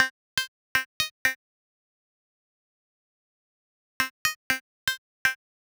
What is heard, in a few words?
Synth Loops 120 C Dry